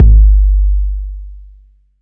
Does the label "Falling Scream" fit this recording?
No